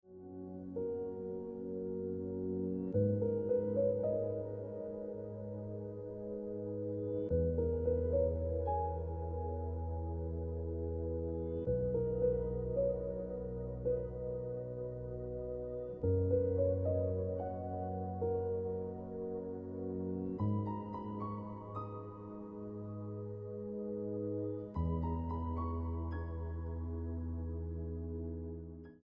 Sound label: Piano Music